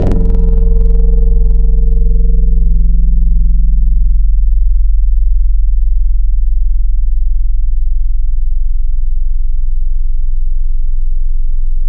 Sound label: Chroma, Tropical Mallets; Synthetic's Serum Collection; SparkPackers